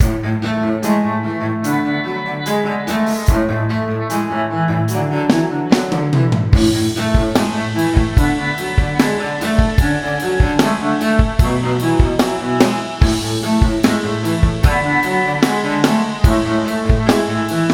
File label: Cello Magic (no ac gtr)